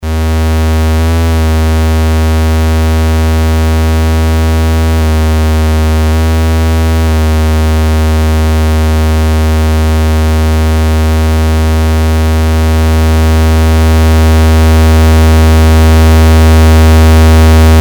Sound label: FM Synth